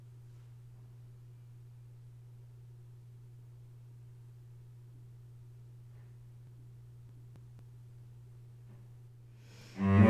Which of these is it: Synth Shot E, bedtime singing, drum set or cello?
cello